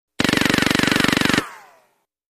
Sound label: Fast Silenced Machin, silenced